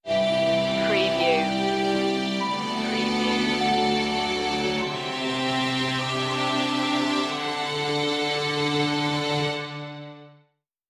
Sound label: regal waltz stinger